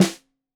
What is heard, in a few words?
Snare 65 Reg Stereo, Deeply Sampled Drums